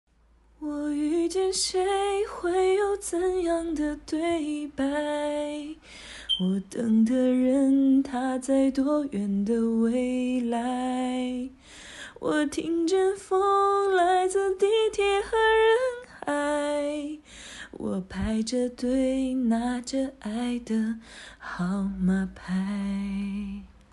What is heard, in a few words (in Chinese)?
清唱